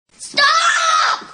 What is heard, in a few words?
taunt; taunts; stompinggrounds; props